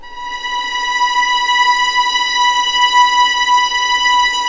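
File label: B5 legato mf violins L; East West; The Ultimate String Collection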